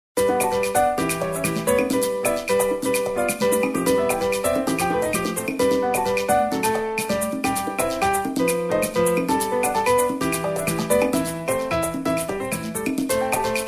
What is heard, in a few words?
Suspense; My Ambitious Girlfriend Romance You Choose; android